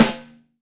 Tight Snare, Roland BK-5; Snares

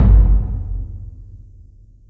hit, PERC; Techno Trance Essentials

